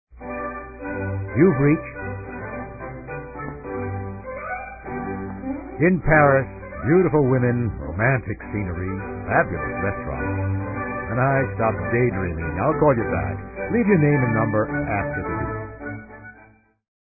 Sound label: French Baroque